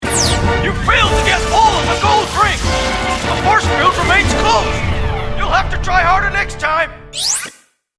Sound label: fail